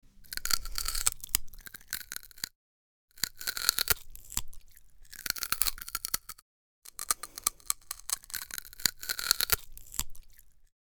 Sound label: Cartoon biting and eating crunching